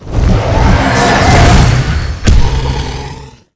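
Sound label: Boss Light Dragon death; monster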